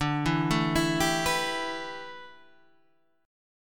A 9th Suspended 4th, D

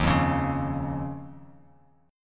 D sharp 7th Suspended 2nd, Bass Standard; strummed; JGuitar

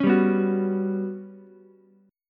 G Major 7th Flat 5th, Ukulele; GM7b5 strummed; 6,7,7,9

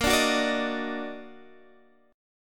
D sharp Minor Major 7th A sharp

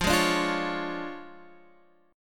D Minor Major 7th Flat 5th, F

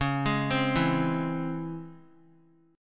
C sharp Minor Major 7th C sharp, chord; C#mM7 arpeggiated; JGuitar

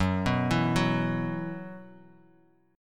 Gb 7th Suspended 4th Gb down, chord; Gb7sus4; arpeggiated; JGuitar

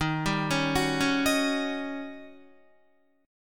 D sharp 7th Sharp 9th Guitar; D#7#9 arpeggiated

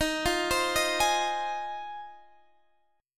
F Minor 7th D sharp

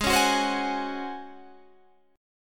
Db Major 7th Suspended 4th Ab

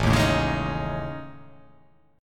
A Major 7th Flat 5th, down

